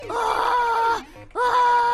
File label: aaaaaaaaaah short